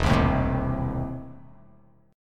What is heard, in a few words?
E Major 7th Suspended 4th Sharp 5th E down; strummed; JGuitar